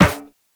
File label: Run Metal Perforated, Footsteps; Warframe; Operator